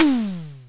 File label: Simmons SD-5 drum machine